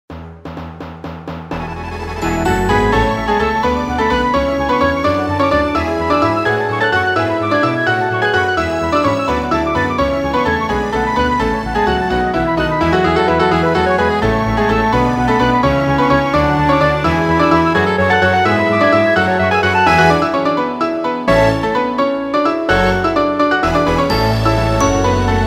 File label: Etude at 85 bpm accompaniment; Etude XX; Goldenberg